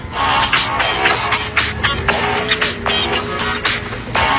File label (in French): sonnerie; franklin